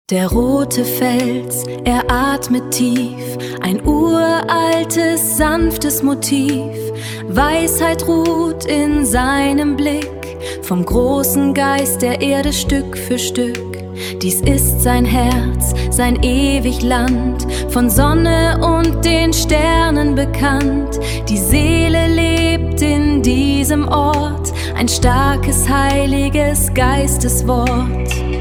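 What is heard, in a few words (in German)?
aboriginal musik für Film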